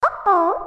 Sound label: sms ringtone